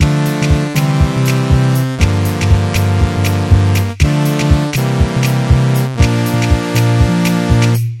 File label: kicks hats claps synth bass